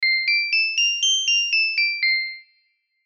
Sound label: ring none